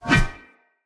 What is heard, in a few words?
Shield Whoosh Short; Weapons; Enemy; Shields; Sinecure Shield